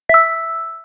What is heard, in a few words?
text receive